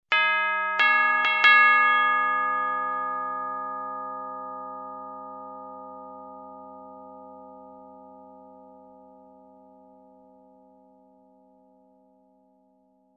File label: Short tubular bell melody